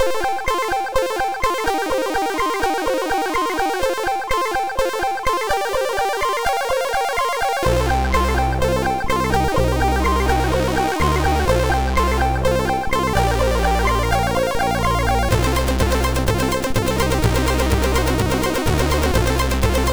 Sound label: sid MOS6581R2